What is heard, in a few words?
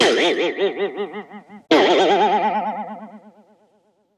Boing x2, Cartoon & Toy; SFXSource